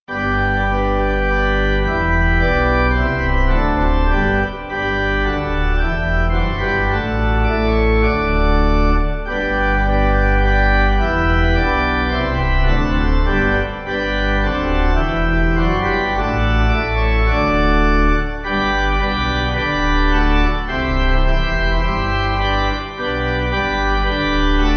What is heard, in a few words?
Pipe